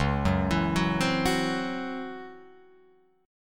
C sharp Minor Major 7th Double Flat 5th, Guitar Open F; arpeggiated; 1,1,4,1,3,1